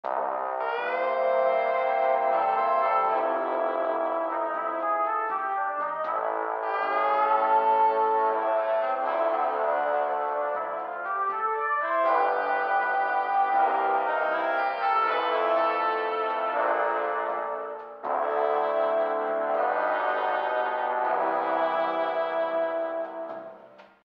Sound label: Ice Lake Elevator; Shrek the Third; PS2